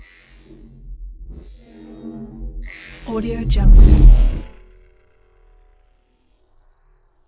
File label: energy plasma core whoosh; Robotic Lifeforms 2; soundmorph